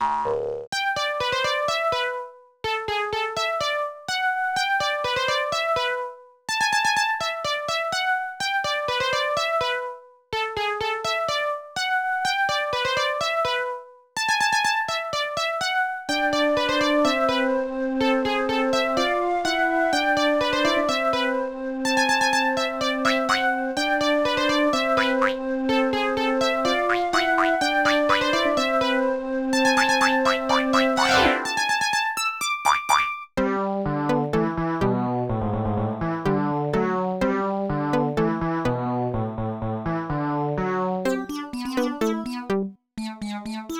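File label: background music theme short; My Frogger Toy Trials; Nintendo DS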